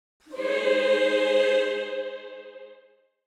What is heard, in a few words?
Angelic choir angels video game play